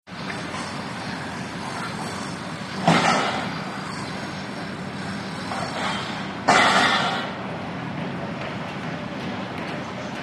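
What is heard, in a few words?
City Construction Background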